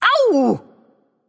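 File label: ouch, female; smite; voicepack; hera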